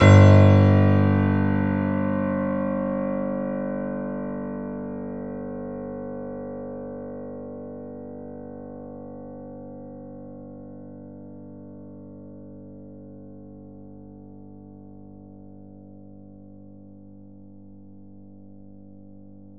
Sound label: Grand Piano G0 ff; Ableton Live 9 Essential Training